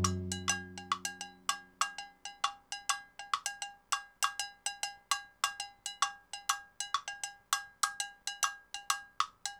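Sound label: timbale cow, latin, 100bpm; Sound Effect Library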